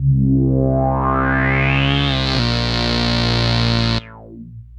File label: Arp C Line, ARP Odyssey; musicradar-synth-samples